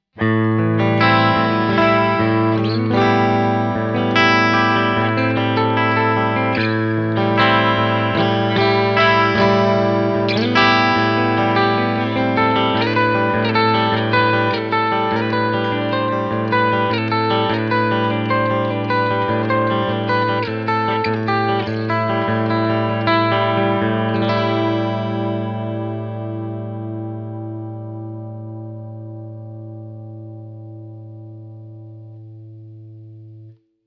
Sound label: gretsch g5623 cb